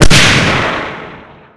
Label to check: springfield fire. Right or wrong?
Right